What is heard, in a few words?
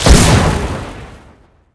spin; psp